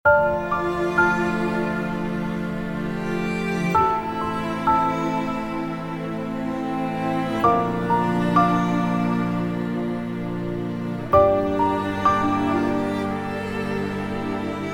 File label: sad violin